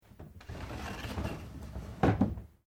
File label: Door Sliding Japanese Screen Shoji Open; Various doors